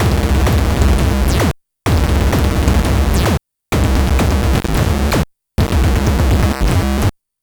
LSDJ Wonkin' Grummel 129BpM, Nintendo Gameboy Loops; maurice.rebell Loops